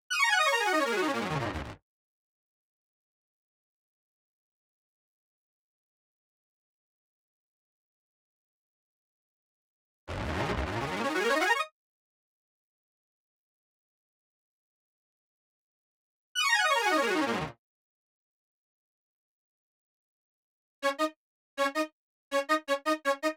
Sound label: synth 4C, Smooth RnB; Big Fish Audio; Legendary; Happiness